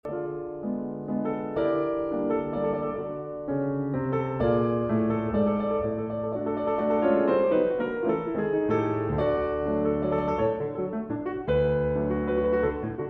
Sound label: Waltz No. 4